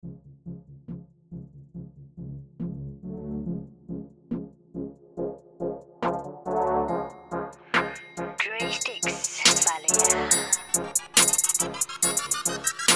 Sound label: Instrumental